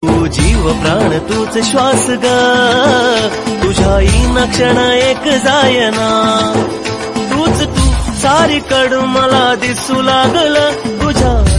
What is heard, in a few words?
Marathi Ringtone